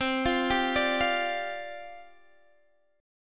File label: C Suspended 2nd Suspended 4th C Guitar Standard; Csus2sus4 arpeggiated; JGuitar